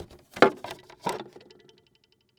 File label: Technology Electronic Machine Wood Record Player Placing Record